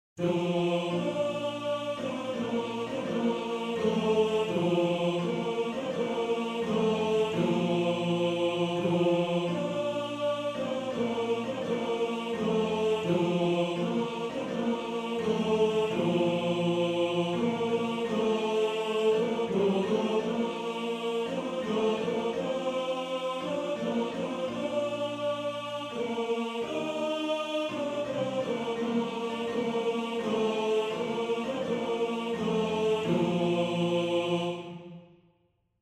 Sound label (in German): Tenor 2